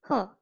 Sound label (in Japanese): "はぁ"